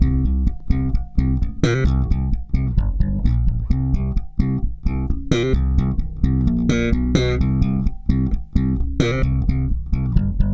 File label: LATIN 130 C7, Bass Grooves; USB Soundscan vol.30; AKAI